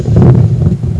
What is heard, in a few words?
Truck; Factory